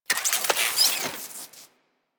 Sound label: Drone Switch Out